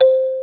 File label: Beep